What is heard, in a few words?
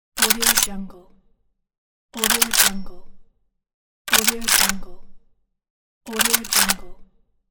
rifle gun cocking; prowaveaudio